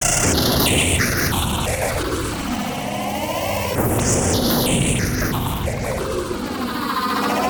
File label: glitch